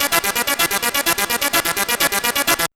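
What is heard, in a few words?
synth sequence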